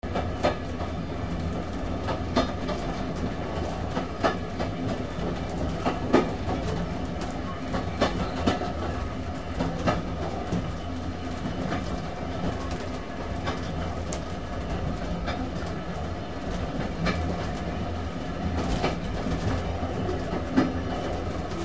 steampunk track movement

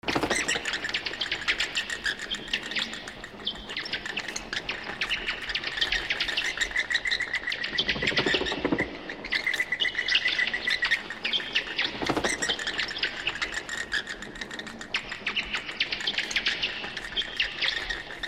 dark cave bats

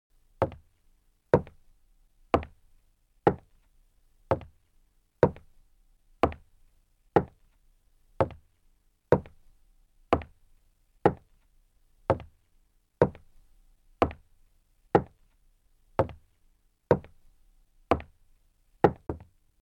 footsteps unisex wood

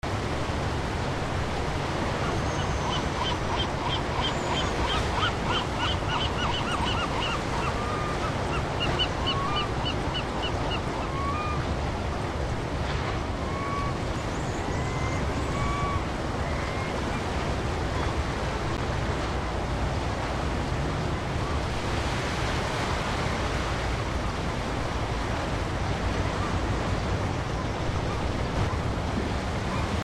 waves and birds; pismo

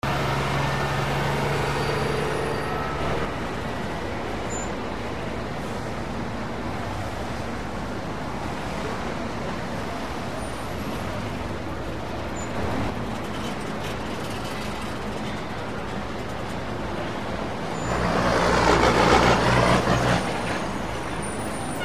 traffic intersection vehicles

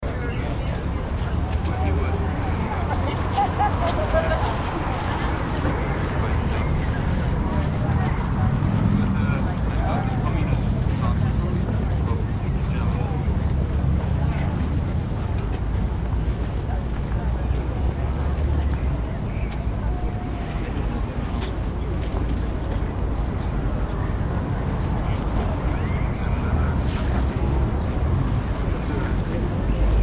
atmo germany berlin traffic and pedestrians; reichstag

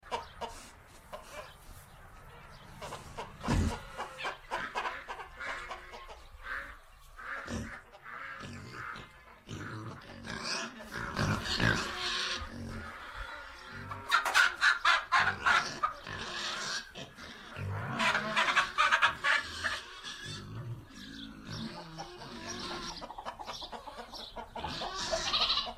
atmo farm barn interior pigs chickens

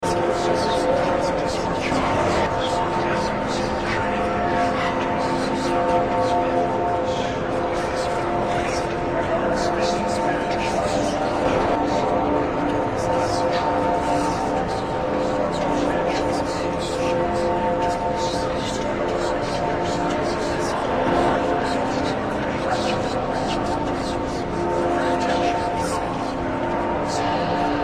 religious bells and whispers mix

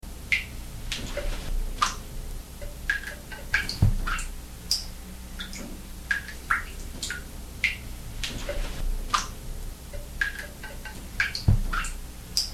underworld dripping slight hum

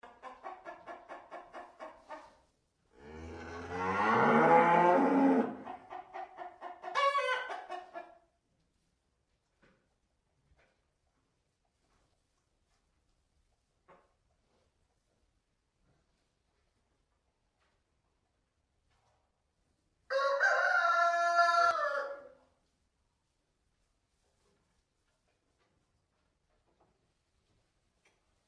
farm barn cows chicken rooster

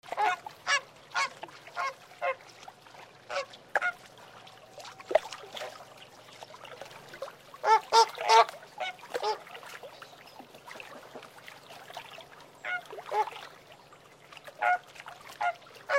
atmo birds flamingos and water

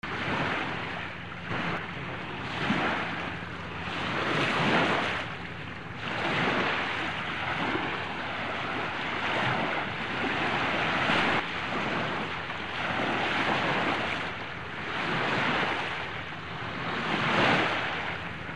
waves surging waves

